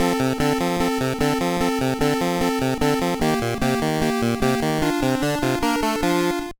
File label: Jingle; gba 2004; angel collection 2 pichimo ni narou